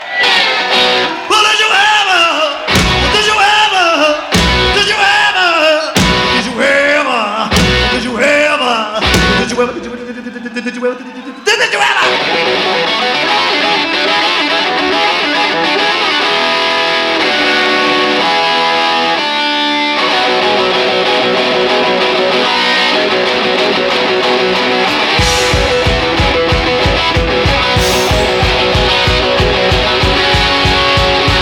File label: (Live)